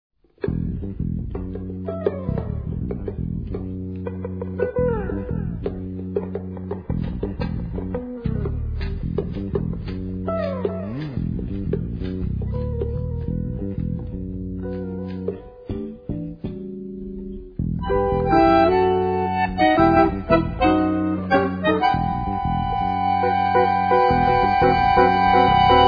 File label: Jazz meets Tango